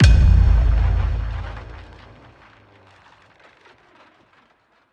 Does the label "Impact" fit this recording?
Yes